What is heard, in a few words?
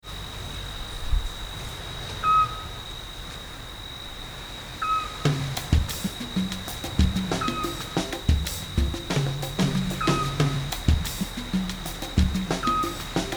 Groove